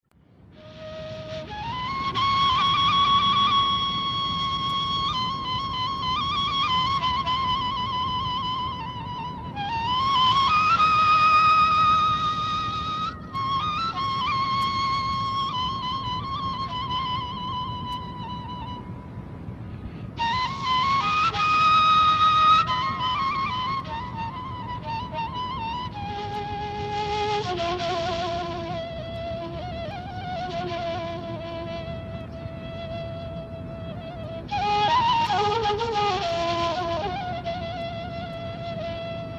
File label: ney taleshi; نی تالشی